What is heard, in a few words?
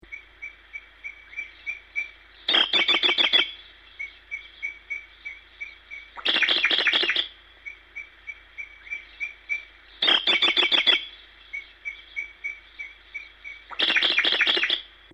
bird